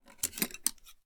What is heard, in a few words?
pick up chopsticks